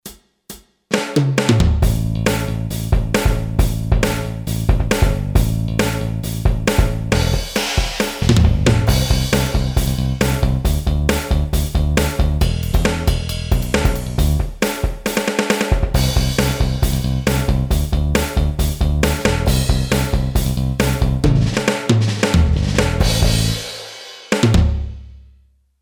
HARD ROCK, Boss DR-3

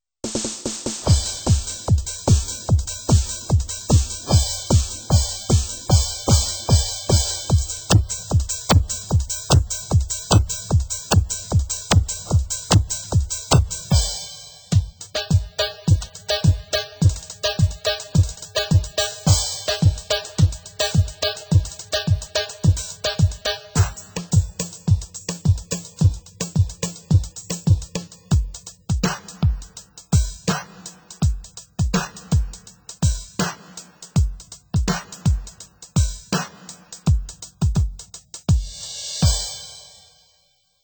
dance kit, Yamaha PSR-530

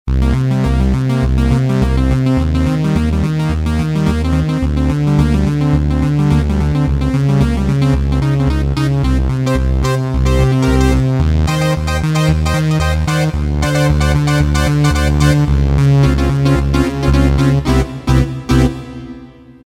Saw Synth, Casio CTK-601 Tones

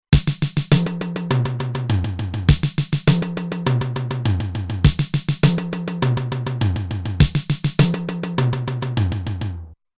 Fill In 4-4, Roland TR-505; Factory Rhythms

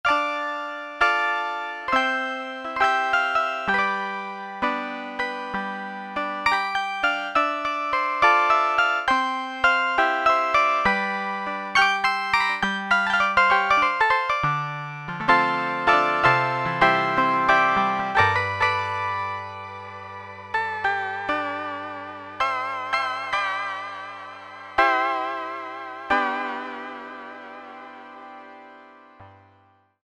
Roland SRX-07 Rox 668 St; Roland SRX-07 Ultimate Keys

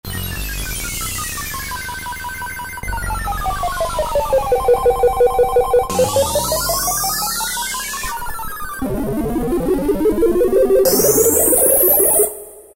sfx 8 Bit Game Over, E-mu PK-6

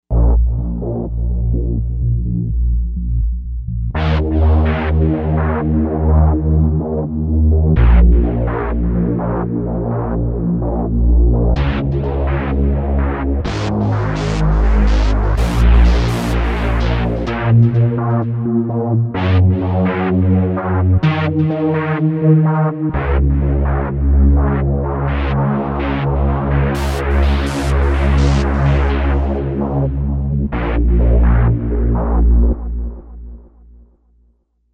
Dark Unison, Novation UltraNova; Factory Patches

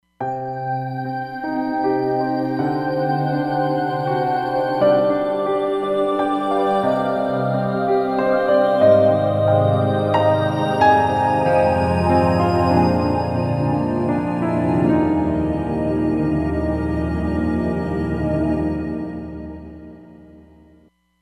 Piano, Roland Fantom XR; Arie Piano

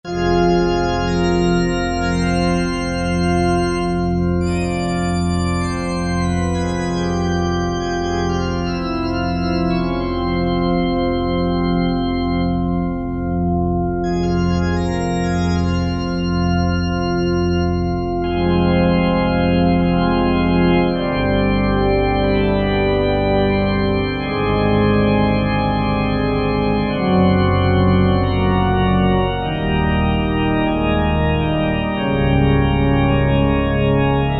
Cathedral Organ; Factory preset demos

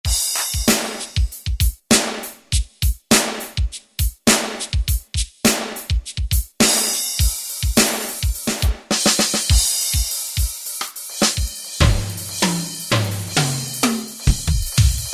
DR Kit, Yamaha TG500

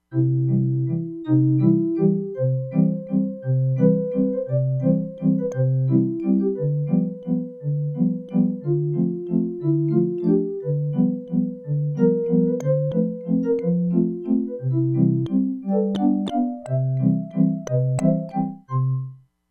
CALLIOPE, Crumar Bit 99; Factory Programs

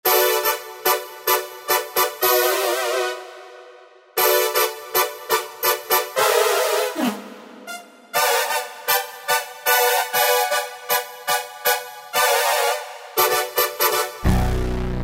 TechnoVint, Generalmusic S2R Performance

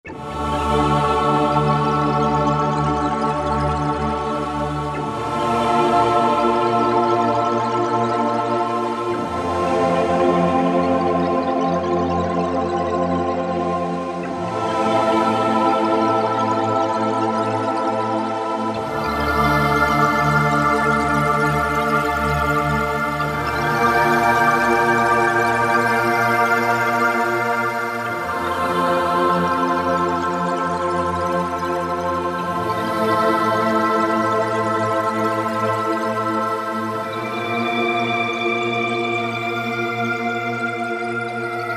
Korg M1 Universe; Factory preset examples

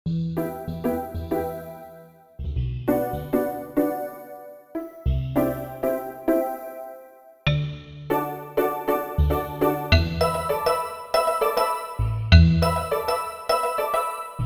Voicey Pizz, Roland JV-880; Factory Patches

